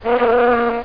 skill bee

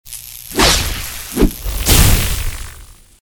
attack; hero

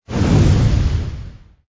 fire, battle

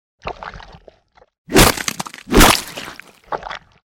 attack; hero sound

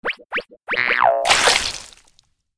attack; hero

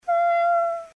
home pet

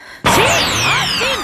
miss

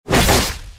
effect cut

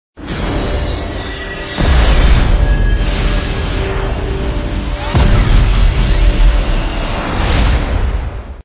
recruit action

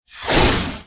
atack crocodile

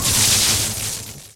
thunder, battle

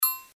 turntable game

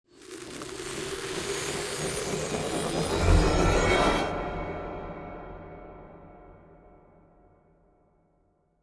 scroll

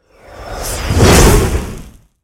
attack; hero

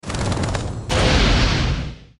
attack; hero

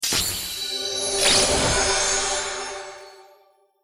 attack; hero sound

